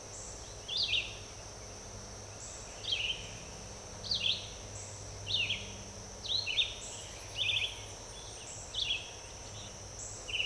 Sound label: REVI, vireos; birds